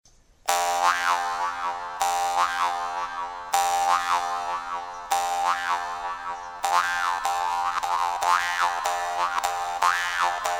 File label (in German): Maultrommel